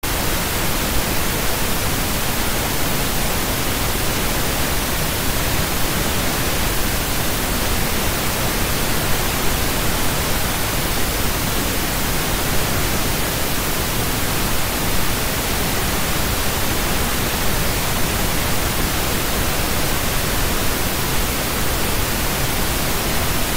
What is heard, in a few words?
Pink noise sleep